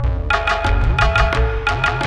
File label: tabla loop